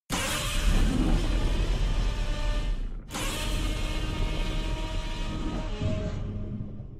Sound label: roar; g21 arisen